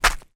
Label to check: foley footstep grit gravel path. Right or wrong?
Right